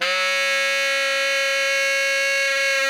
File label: TENOR GROWL C#5, Saxophones; Greytsounds; Philip Wolfe David Crozier's Saxophones